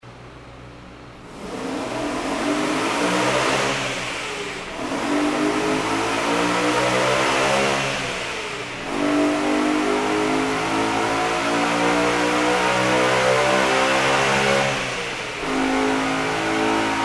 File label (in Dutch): BMW M3 Acceleratie; E92 M3